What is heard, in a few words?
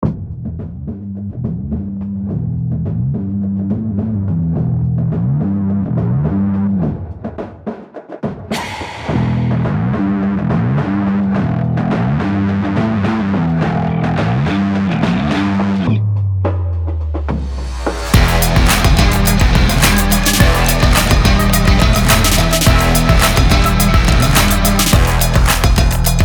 Rock Extreme Sport